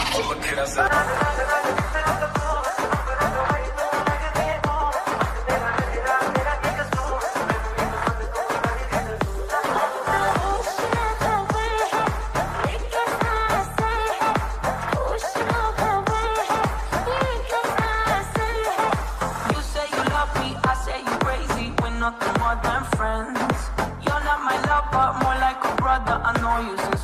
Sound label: english hindi remix ringtone